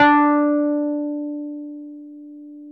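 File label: Wurlitzer electric piano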